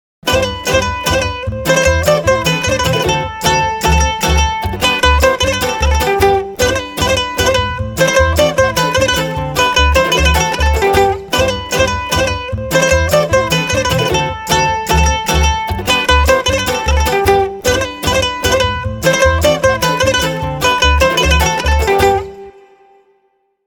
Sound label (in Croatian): Anarkista Robija instrumental